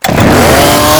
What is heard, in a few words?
chain wind up